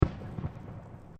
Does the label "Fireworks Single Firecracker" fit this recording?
Yes